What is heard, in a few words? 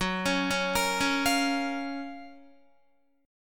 F sharp Major 7th, Guitar; arpeggiated; JGuitar